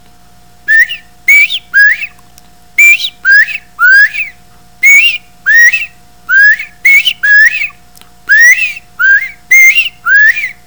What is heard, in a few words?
bobwhite covey call